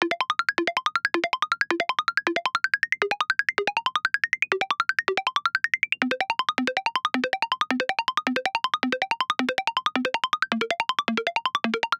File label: 160bpm, Melody Loops; PoppinCandi; TRUTH-HZ; Crowdsourced Sample Pack